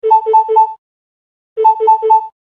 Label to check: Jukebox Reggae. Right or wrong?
Wrong